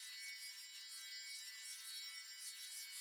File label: Spell Pickup Idle Loop